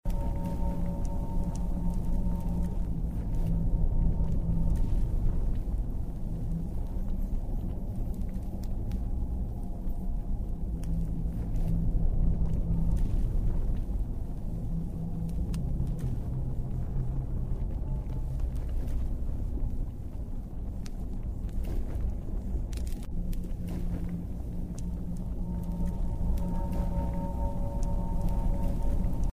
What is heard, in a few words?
Atmos Fire Dungeon